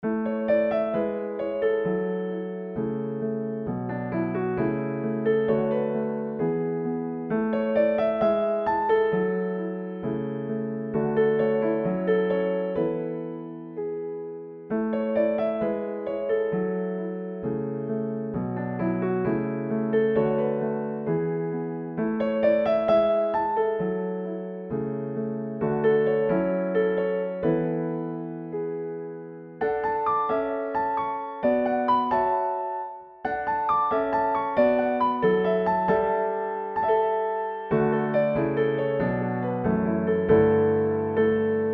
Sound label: Piano Solo; Osterspaziergang